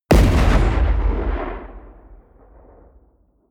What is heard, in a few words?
missile medium explode close